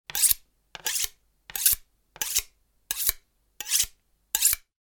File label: sharpening knife